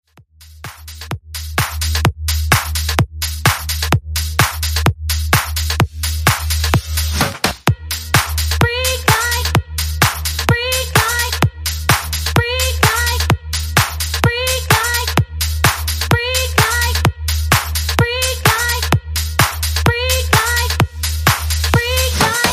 90s Slap House

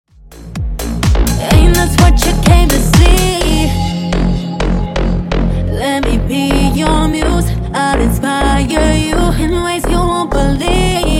Funk House 126Bpm

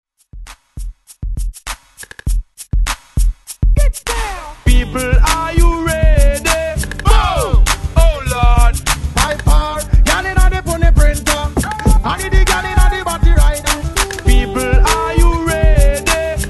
RNB Hyped Redrum 100 BPM

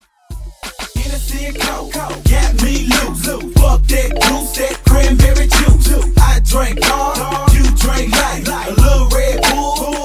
HipHop Throwback Redrum 92

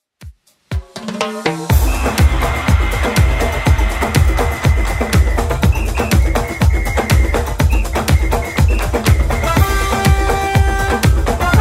90s, 122bpm; remixplanet

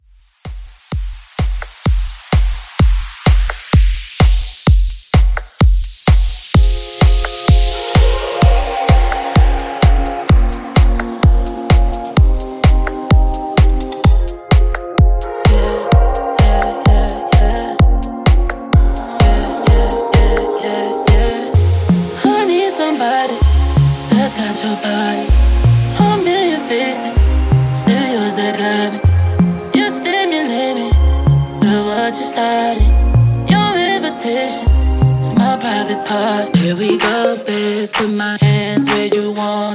Club Edit 128Bpm

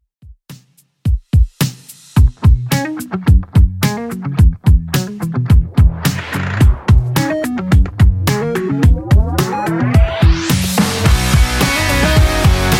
Top40 Redrum 108bpm

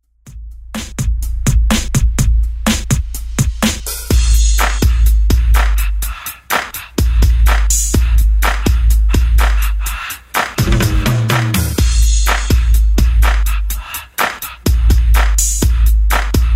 80s Redrum 125bpm

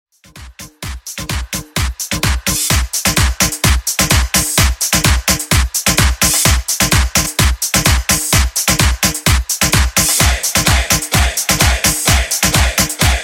Shake Rumba Hype Moombah 128Bpm

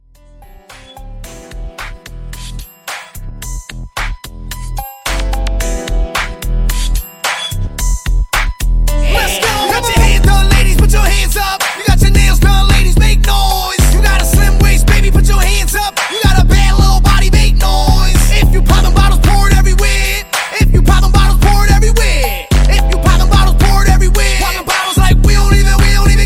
Hype 110

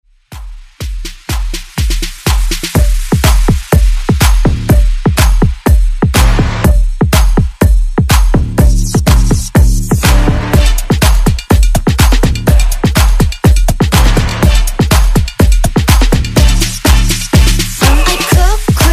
Kpop Dance; Redrum

